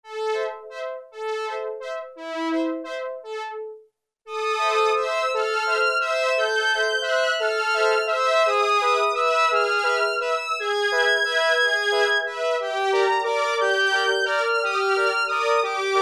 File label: STRINGS, Oberheim OB-8; Factory Patches